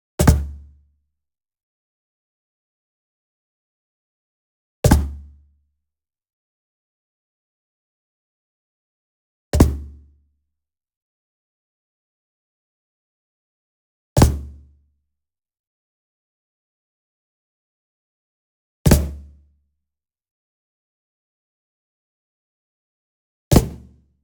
Wood Percussion Cajon High Flam Slap Near; boom library; Cinematic Strikes Construction Kit

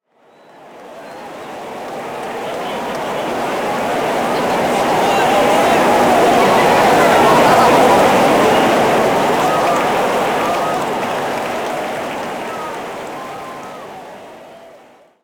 Crowd Ambience; Pitch Black Audio Pong

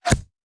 Bullet Impact; Sound Ideas; Guns

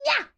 grandma knife waaa; Blind Drive

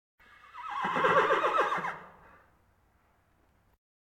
Animal effects snorts grunts farm ambience

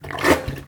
Suction Toilet Plunger; SFX Kit; Foley - Misc

